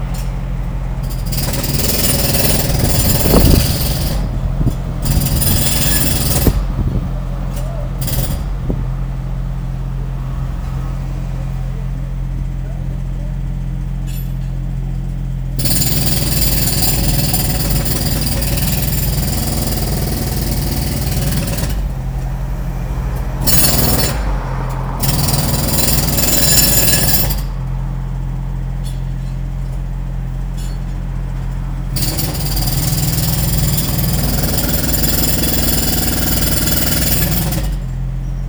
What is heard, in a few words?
Jackhammer